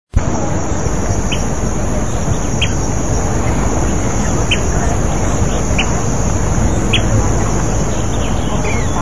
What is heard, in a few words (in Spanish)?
voz fiofío pico corto